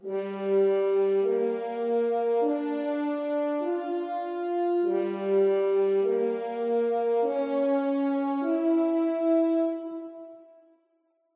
Horn; Chasing Fakir Gm Rnd; tintin reporter cigars of the pharaoh

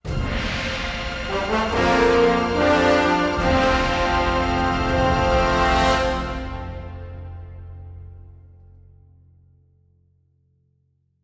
Level Up Stinger

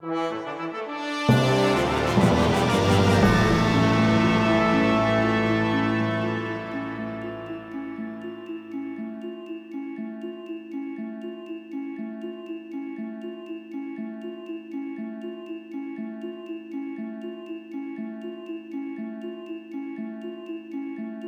Dungeon Floor Clear; The Nightmare of Druaga Fushigi no Dungeon; PS2

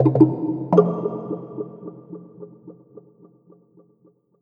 Tribal Omni

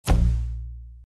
Exit Box

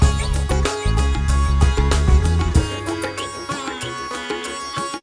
Loops Dance Sitar; Scratch game maker software

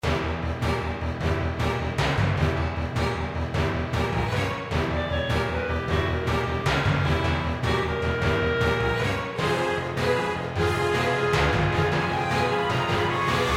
Epic Boss Battle; Trouserheart; Android

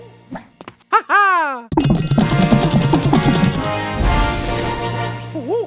Chest (Gold); Mario + Rabbids Kingdom Battle

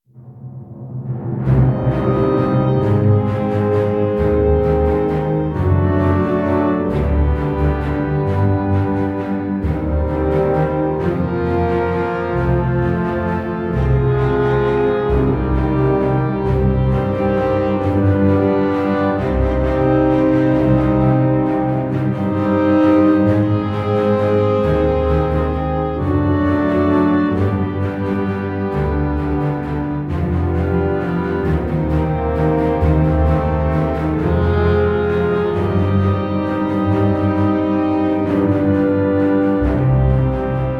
background human; Armies of Exigo

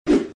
Sfx Enemy Knight Attack; Mighty Knight 2

